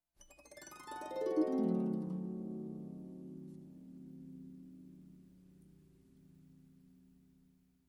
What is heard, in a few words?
Soft Harp down glissando